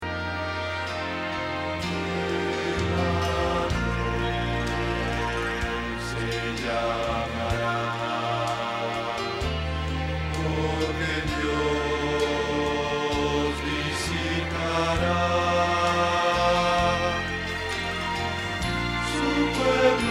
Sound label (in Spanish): Bajo